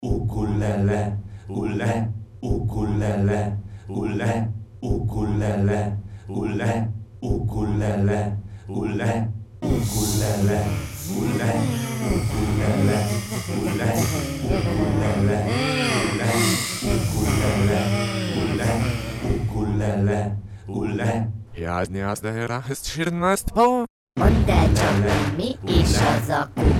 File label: ukulele